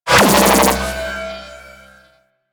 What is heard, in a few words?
Jamming Radar Ring; Warframe; Missions; Railjack